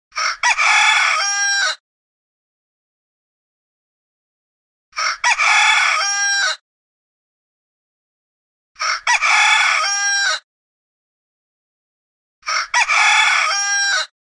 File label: alarm rooster ringtone; iphone